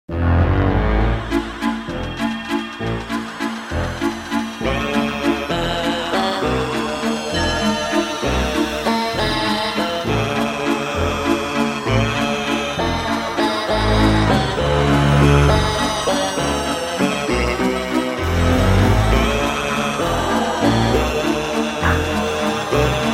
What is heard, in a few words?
Skaters Waltz